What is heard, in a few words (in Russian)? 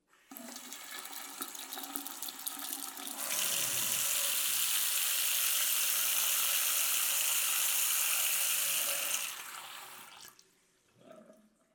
zvuk krana